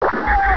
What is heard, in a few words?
BLAST, Gunshots; sound effect